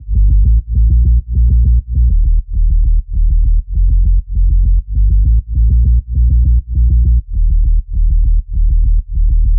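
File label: Sub Bass, 100, G minor, Wet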